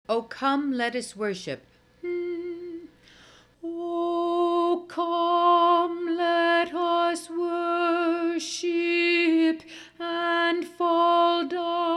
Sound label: alto, choir; come let us worship